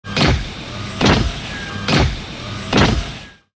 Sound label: robot walk